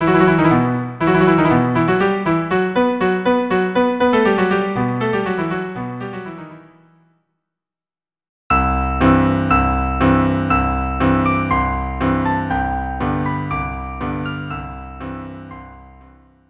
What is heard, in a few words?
violin cello duo; Anvil Chorus